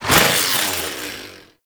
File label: Hand Saw Whoosh